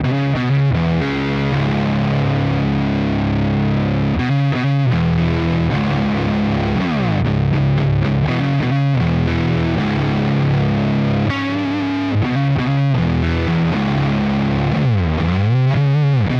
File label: Cort MBM 1 Peavey Neck gain